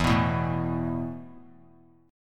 E Suspended 4th E, down, 3C,3F,3A,3D; Esus4 strummed

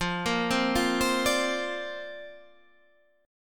C 9th Suspended 4th F

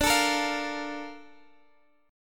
Bb Major 7th, D

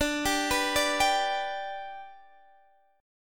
D 6th Suspended 4th D, chord; D6sus4 arpeggiated

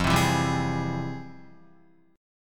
F Minor Major 9th, Guitar, chord; FmM9 strummed; JGuitar

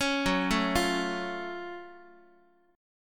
F sharp Minor Major 7th, Guitar; arpeggiated; x,x,4,4,1,4; 4A,4E,5A,5D,5G#,6C#

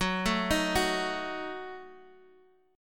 F sharp Minor 6th, Guitar Drop D; chordshape; down

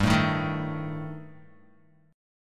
G Suspended 2nd Sharp 5th, Guitar; strummed

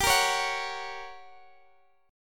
F# Major G

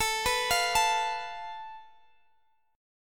A Suspended 2nd Sharp 5th

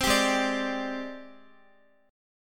G sharp Major Sharp 11th, Guitar; G#M#11; x,x,12,12,8,10; strummed

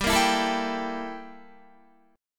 G Minor 6th Add 9th G down Guitar

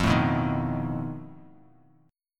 F 7th Flat 5th F Bass Standard; F7b5 strummed; 13,12,9,8

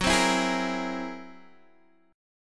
Gb 9th Flat 5th Gb down Guitar DADGAD, chord

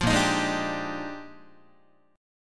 A sharp 13th, Guitar chord; strummed; JGuitar

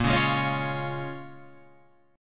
Bb Minor 7th, Guitar, Open F, chord; Bbm7 strummed

